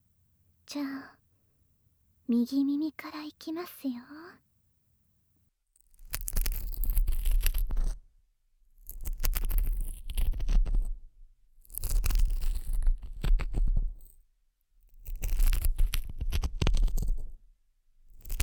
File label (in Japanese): 両耳かき→両耳ローションマッサージ 安眠音声, ASMR; 片耳、両耳ver.